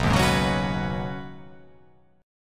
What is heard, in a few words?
B 6th Add 9th B, chord